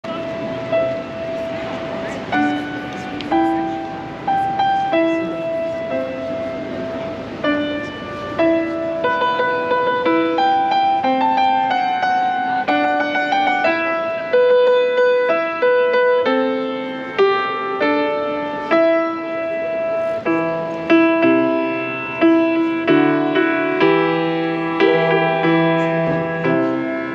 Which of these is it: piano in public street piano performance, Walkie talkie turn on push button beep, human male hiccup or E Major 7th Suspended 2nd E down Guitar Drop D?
piano in public street piano performance